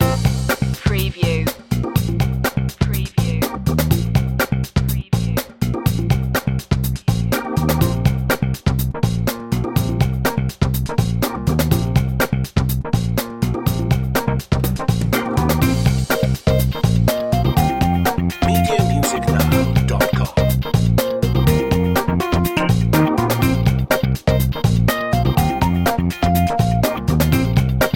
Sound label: funk loop